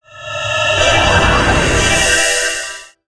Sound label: Rage; Fly For Insanity